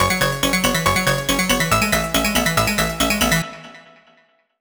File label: dubstep club arp